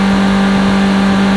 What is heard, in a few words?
Suz, Engines; Superbike 2001